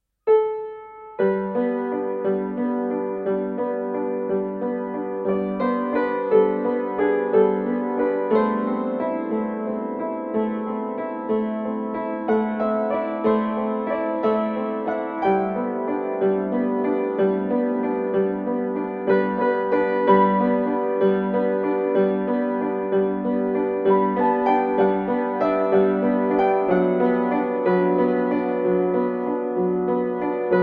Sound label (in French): piano